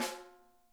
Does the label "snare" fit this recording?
Yes